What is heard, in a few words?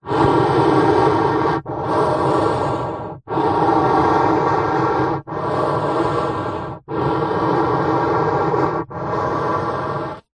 human long breaths through long hollow card tube; zapsplat